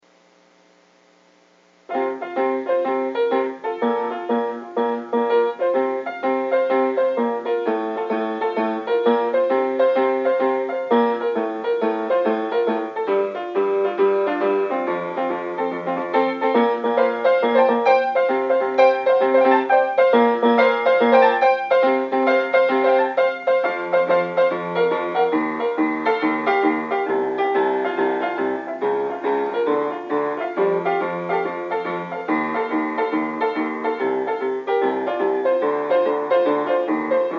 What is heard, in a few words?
Piano Brainstorming, Instrumental